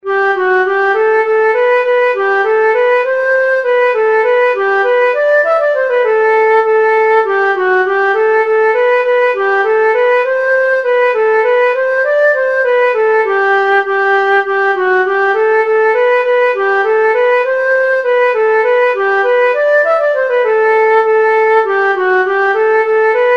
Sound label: Gotland polska, nyckelharpa